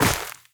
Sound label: step run, footsteps player grass